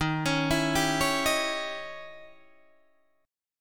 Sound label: Eb 7th Flat 9th Eb, Guitar Open D